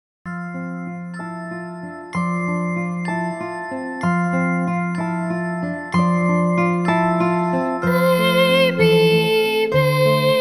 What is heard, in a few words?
Bedtime Lullaby